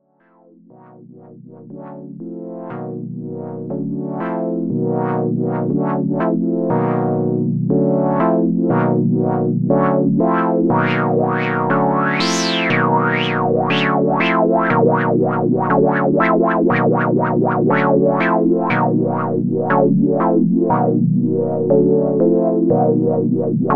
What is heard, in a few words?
Roland SH 01A Chord